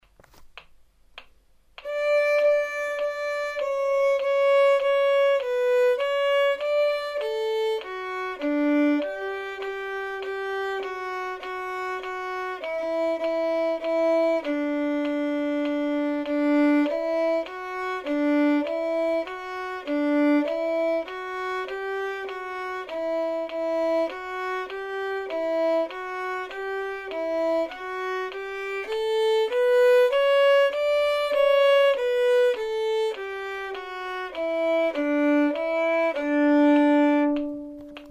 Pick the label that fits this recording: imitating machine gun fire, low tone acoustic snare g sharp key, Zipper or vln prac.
vln prac